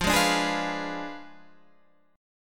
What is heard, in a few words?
F Diminished 7th F down Guitar Standard; Fdim7 strummed; JGuitar